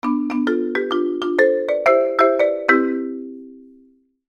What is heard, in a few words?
marimba ringtone